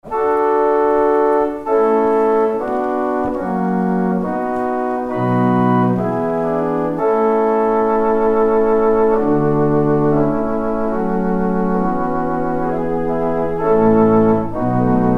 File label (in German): Tremolo, Rundfunkorgel